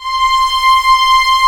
VIOLIN C 5; AKAI S-Series CD-ROM Sound Library VOL-8

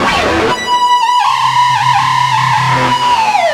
WILD, SPECIAL EFX, Guitar; East West; Steve Stevens Guitar Samples Collection

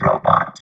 VOX VOX, Electro Vox

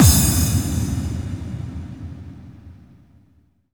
BD-FX, Dance Mega Drums; Best Service

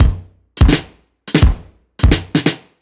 Kit Heavy Swing, 85bpm; off the grid

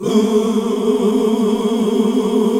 Gosp. Oooh

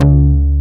fat bass; Dance; BASS SOUNDS; Masterbits; Dance Trax

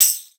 TAMB, PERCUSSION; Best Service ProSamples vol.42

